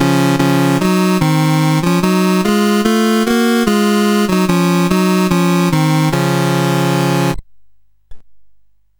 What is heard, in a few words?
Summer Games, CSG8580R5